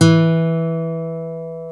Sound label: GTR E3B, Dual Guitar, Ac 12+; Club-50 - Foundations